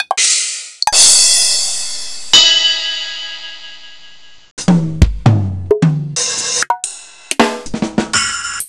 Tama RockStar

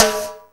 Snare, Roland JX-305

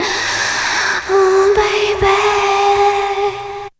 SEX; Zero G; Man Machine

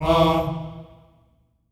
Staccato F E3 L, Symphony of Voices; Spectrasonics

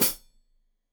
Hat Foot, Hi-Hat, Cymbals Stereo

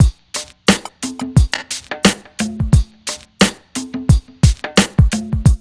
beat, hip hop; HHM 88

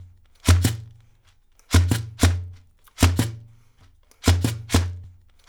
LOOP, Percussion, FUNK; InVision Interactive; Percussion Slam

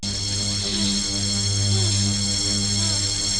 LOOP; Rubber Chicken Software Sample Bank

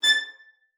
A6, VIOLIN SPICC; Xsample VOL-5

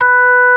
ORG B4; B3 FULL

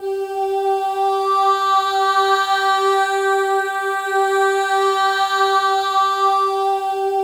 MOVING V4, Symphony of Voices; Spectrasonics

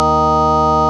CLICKY D#2, clicky organ

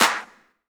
CLAPS HIP; Best Service Dance Mega Drums

